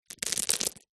foley pebbles many small drop low height soft surface